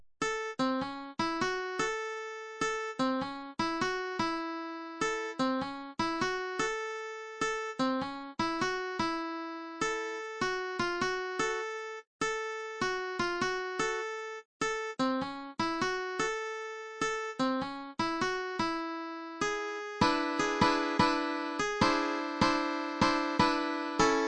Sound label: 12 Bar Blues in A Basic, uke; Fingerstyle Ukulele; workshop; Fingerstyle Ukulele for Beginners; Soprano, Concert and Tenor ukuleles